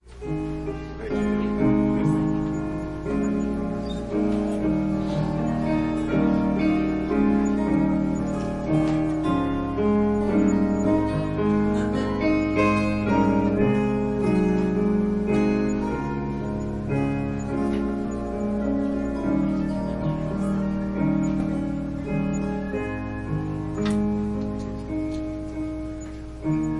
Piano prelude; Pentecost

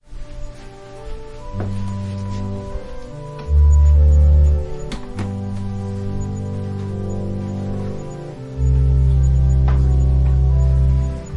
Organ prelude; Free indeed

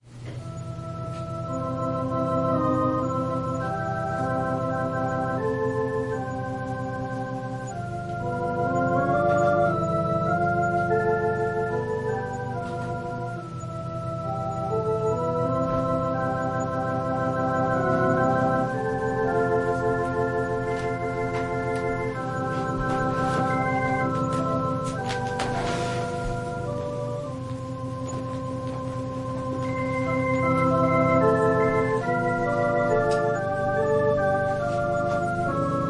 Organ prelude

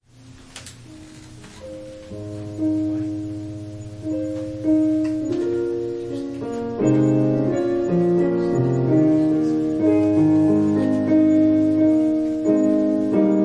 Piano prelude

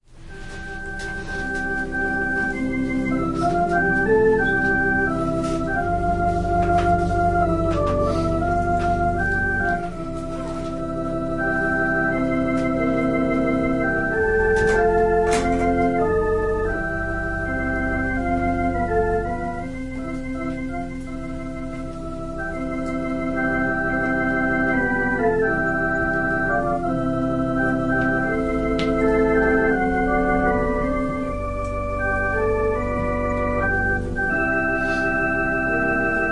Organ prelude

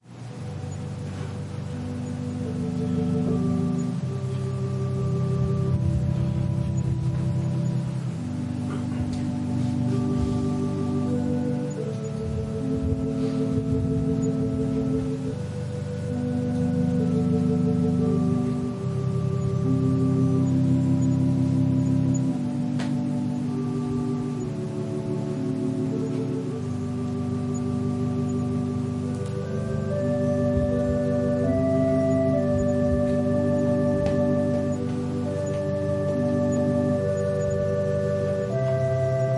Organ prelude

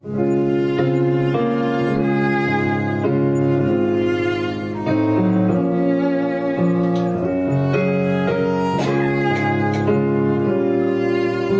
Piano and violin prelude

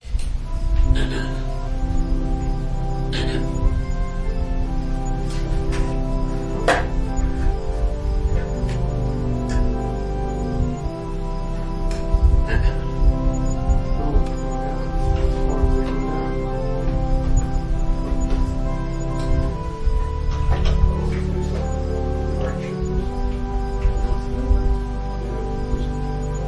Organ prelude; Blind Bartimaeus

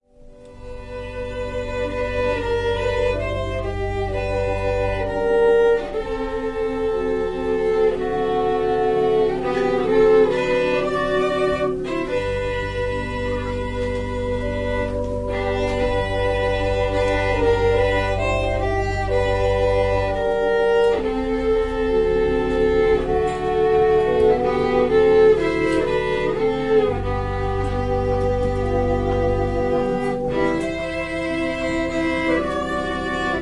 Organ and violin prelude

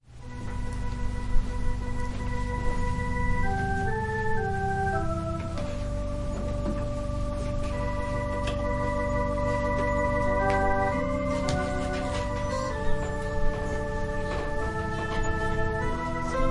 Organ prelude

